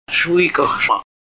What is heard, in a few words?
deer rattles; shuwikahsha